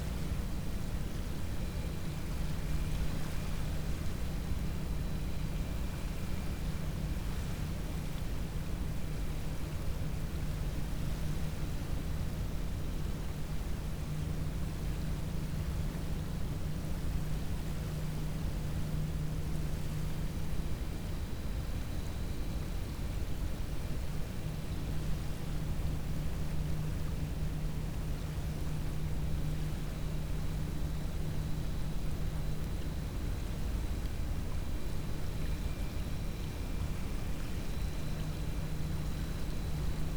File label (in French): cachalot negatif, Physeter Macrocephalus